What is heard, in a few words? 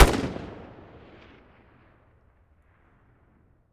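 weapon ar fire player atmo exterior; gmod; viper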